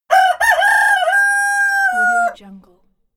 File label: rooster crowing; soundjay